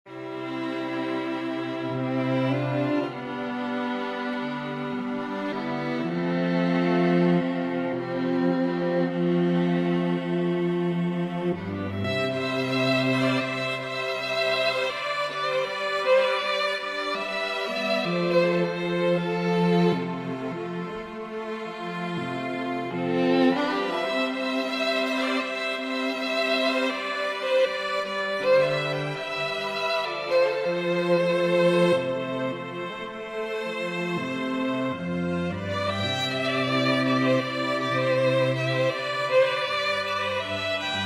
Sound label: String Quartet